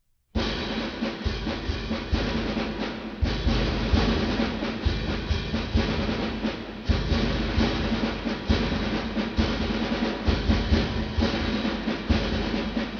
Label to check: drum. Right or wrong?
Right